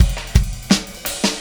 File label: Static Beat Fill A; Sonic Foundry (Sony Creative Software); Indie Rock Anthems; Session 13 - Static